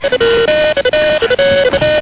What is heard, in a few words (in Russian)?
Phone, Звуки